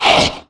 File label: blaze hound damage